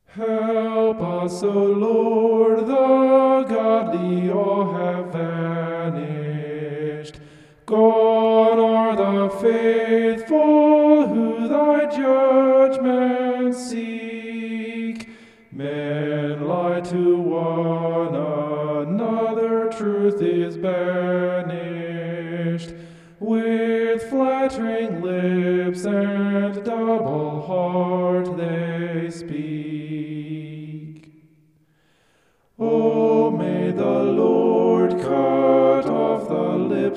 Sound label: vocal homoph; psalm 12